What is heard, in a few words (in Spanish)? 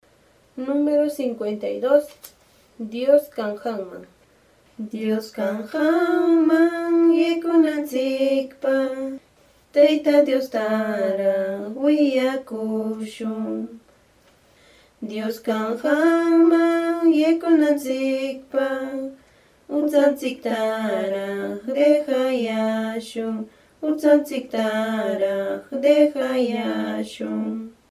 cancion